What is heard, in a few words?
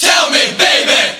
RAVE